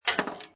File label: Pinball Sound Clank Then Plate Touch; Video Game Sound Effects